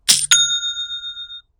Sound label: Cha Ching; Shopify; yagraphic